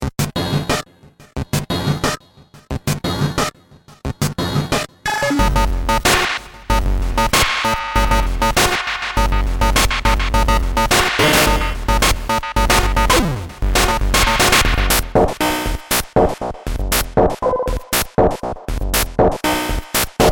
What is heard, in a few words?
Micropak Blip; PUREMAGNETIK